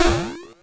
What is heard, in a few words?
Drums, Hits; DCM8